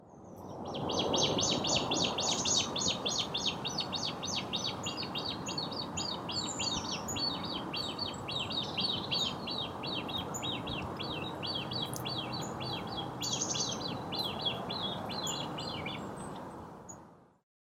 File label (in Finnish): nuori uikku; silkkiuikku; poikanen; linnut